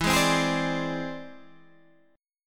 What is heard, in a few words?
E Augmented 7th E down Guitar Standard; E+7 strummed; JGuitar